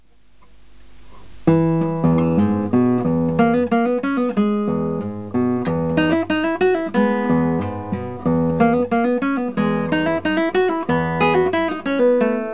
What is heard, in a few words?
slur, guitar